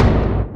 Sfx Animated popup finish moving

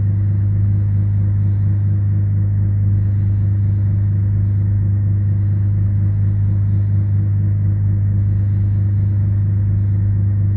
Intro Fan Low Fixed Loop

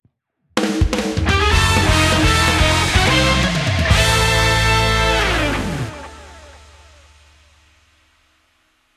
Victory Jingle 126 4-4; CarrionRiders

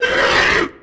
Gargoyle Hurt Roar; Mars Sortie

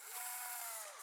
Camera Print Rev

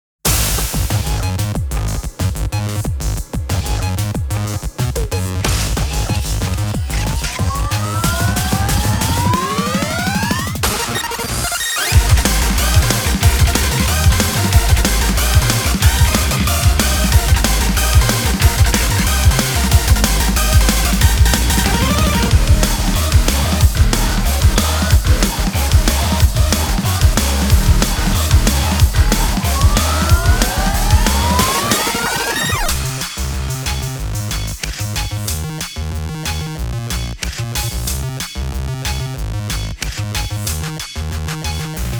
Instrumental Ver.; Blue Archive; Seishun Ensemble Vol.14; Engineer Club

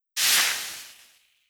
Nature

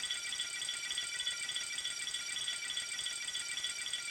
Ui Loading Loop

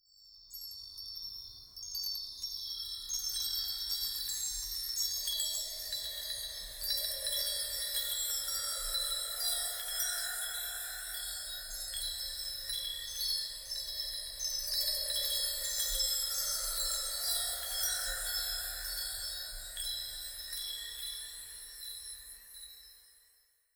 Chimes

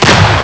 Shotgun

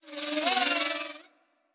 Engineer Absor Drone

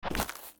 Climb Grass

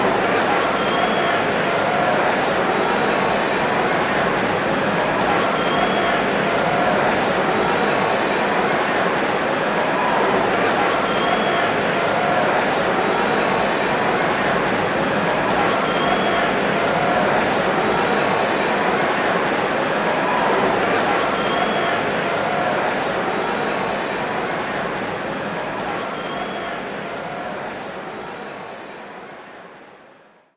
Music Frontend

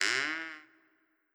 Sarco Squeak

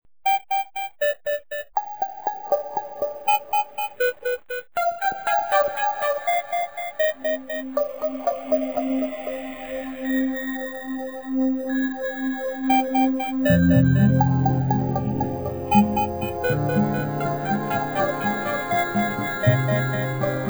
Radio Song; Smeshariki Museum of Communications

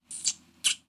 Transformation; rabbids multiverse; iOS, macOS, Mobile

